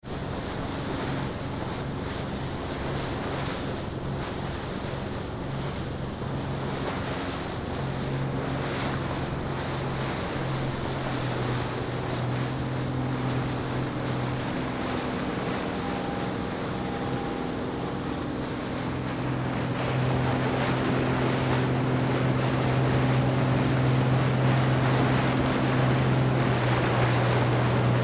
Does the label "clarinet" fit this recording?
No